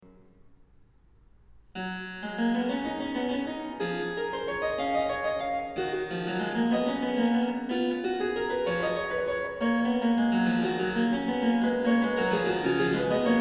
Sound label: Harpsichord; Variatio 27. Canone alla Nona. a 2 Clav; Bach; Goldberg Variations; J S Bach; BWV 988